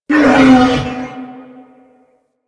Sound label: Wolf Pain; Animals; Warframe; Quests; Duviri